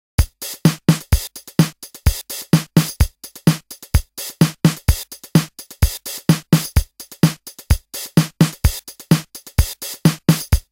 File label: DMX PATTERN